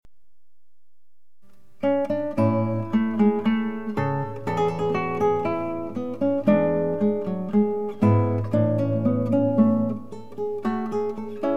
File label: Bouree I, GuitarSolos; from Cello Suite 3